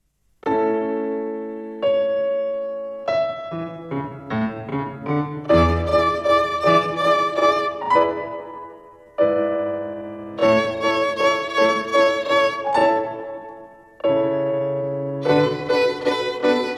Rhythm with Three Fingers Fourth Twinkle Rhythm

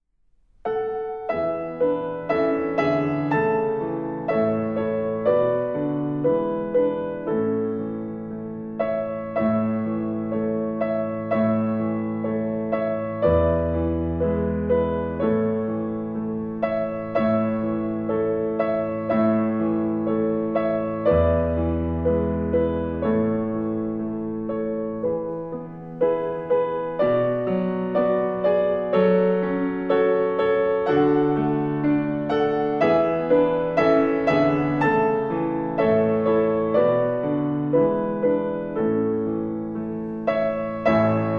Oh Come Little Children Performance Piano

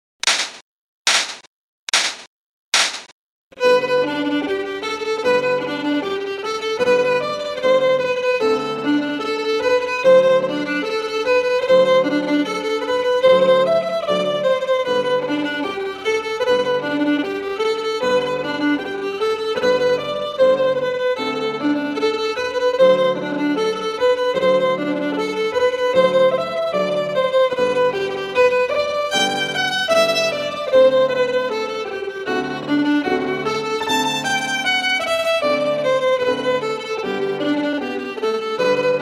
ETUDE VAR 1 LENTO

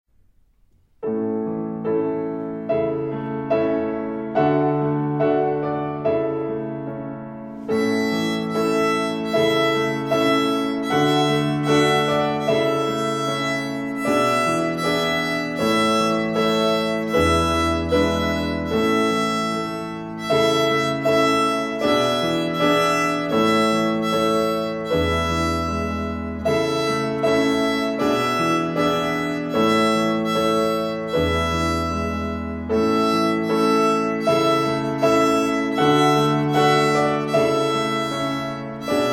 The Theme on the Open E String

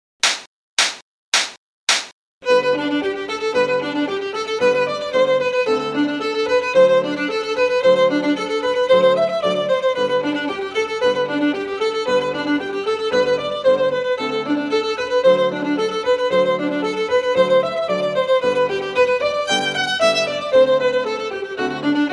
ETUDE VAR 2

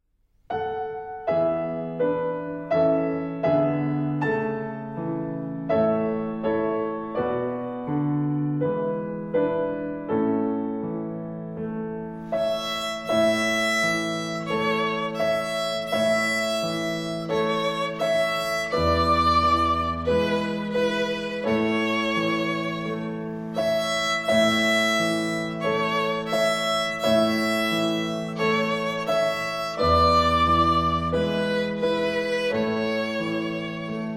Slow Practice; Oh Come Little Children

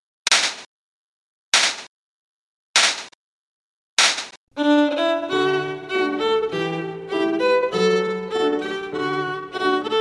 ALLEGRETTO LENTO

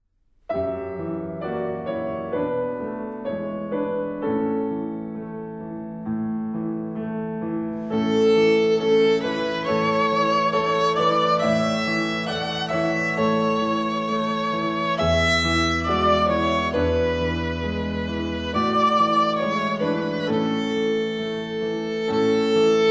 Strings; Long Long Ago

